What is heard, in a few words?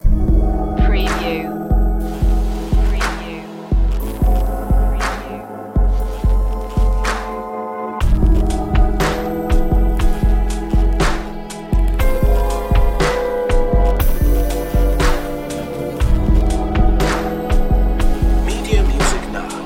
relaxing dreams 60bpm